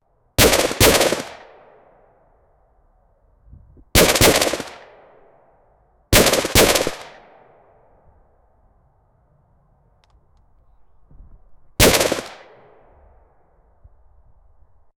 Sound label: 75mm gun from World War